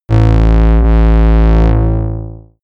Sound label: shot buzzy wave, games zaps buzzes